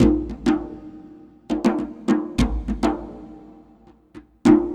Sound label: Djembe; Ambient; Sonic Foundry (Sony Creative Software); Ambient Atmospheres & Rhythms